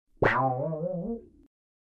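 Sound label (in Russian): похоже на мяу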